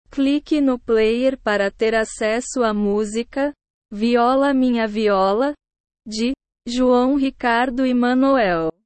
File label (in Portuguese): viola